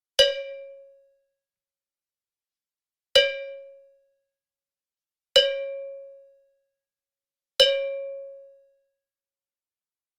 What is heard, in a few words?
metal strike